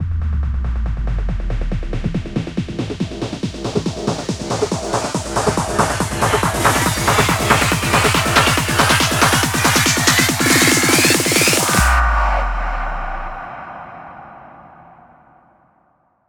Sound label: 140BPM 8Bars, HandsUp & Trance Fills; Landscape; Vengeance Samples